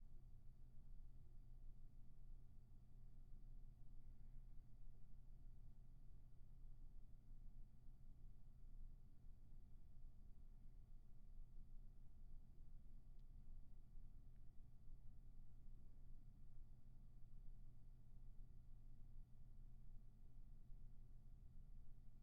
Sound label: roomtone